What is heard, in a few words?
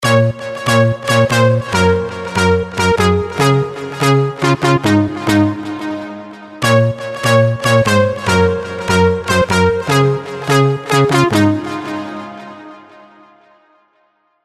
COMP RISE